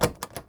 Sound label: Door Locked; welcome to the game ii